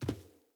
Sandals Land Dirt, Footsteps; Player

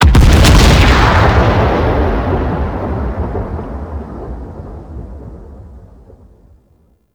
Capital Destroy Explosion; Gameplay; Mission Three; Grineer; Warframe; The New War

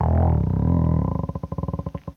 Mushroom Creak; Warframe; Venus Open World; Venus Landscape; Ambience

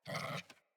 Puppy Idle Growl Playful; Enemies; Vocals; Kubrow

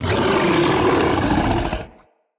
Kubrow Feral Vocal Angry

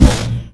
Colossus Swing; Warframe; Colossus Boss

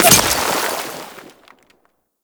Trench Small Dirt Impact; Warframe; Tau

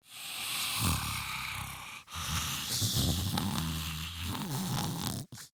Breathing Grineer Ghoul; Grineer Ghoul Expired; Battle Barks

